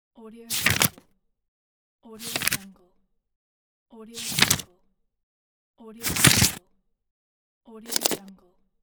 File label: turning paper scroll page